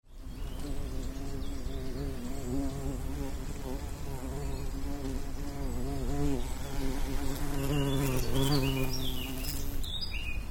Hummel

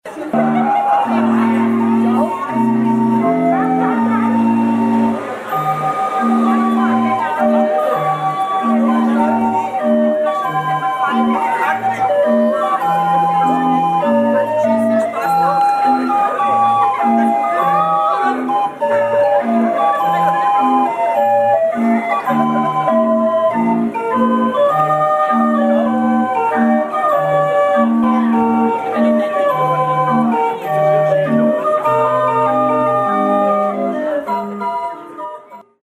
Drehorgelfestival; Waldkirch

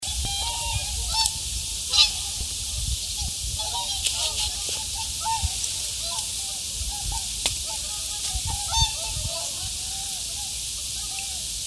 Singschwaene Oderbruch

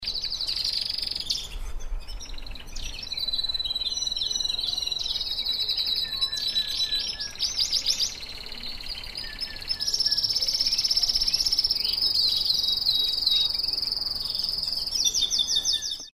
Kanarienvoegel

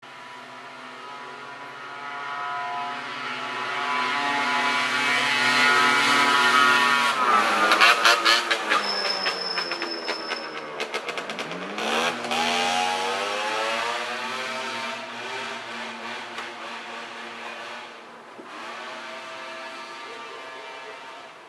Moped

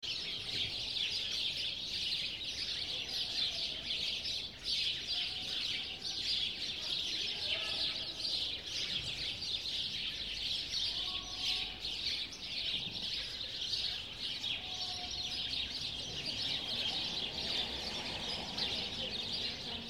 Spatzen

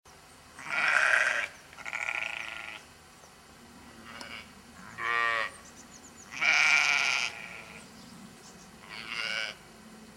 Schafe

